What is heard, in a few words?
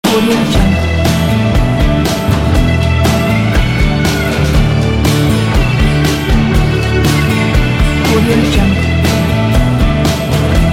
old rock loop